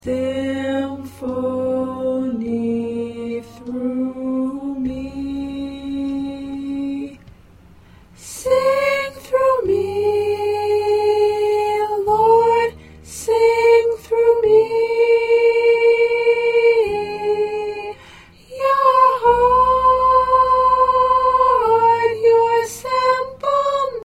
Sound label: Alto, choir parts